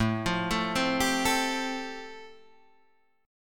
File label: G 7th Suspended 2nd Suspended 4th A